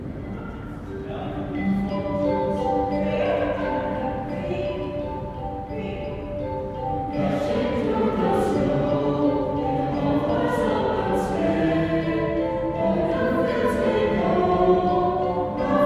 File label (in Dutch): Jingle Bells, Koor